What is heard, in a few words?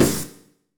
HIT 4 FX; Bolder Sounds - Granular